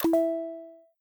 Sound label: fx form submit